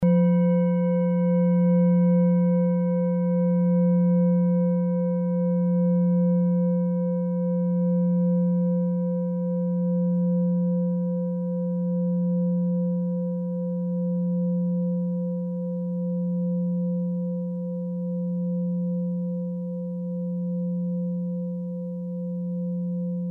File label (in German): klangschale set; Klangschalen-Chakraset; Gewicht = 1550g; Durchmesser = 21,6cm; Höhe = 9,6cm